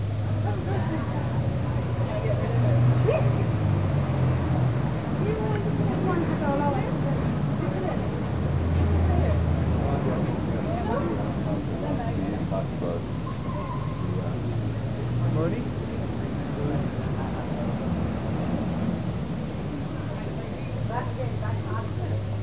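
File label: street ambience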